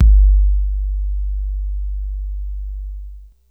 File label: Kicks; R8